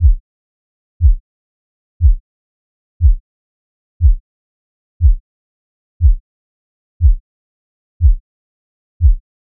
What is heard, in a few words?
Looped Burst 32 To 80 Hz